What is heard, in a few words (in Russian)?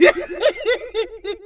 smeh